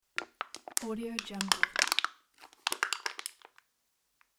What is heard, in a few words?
crushing aluminium can; giosyproduction